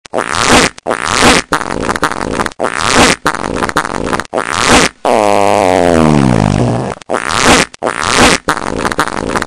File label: farts